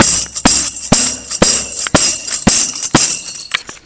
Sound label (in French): tambourin